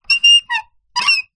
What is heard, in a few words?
foley wood balustrade turn squeak; zapsplat